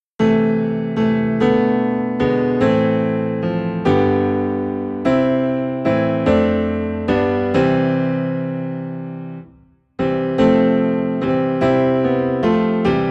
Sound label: Piano Low in C; christmas; Good Christian Friends Rejoice